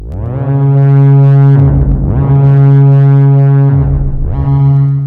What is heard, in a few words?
Theremin midi echo